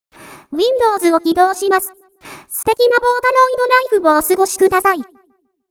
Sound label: windows startup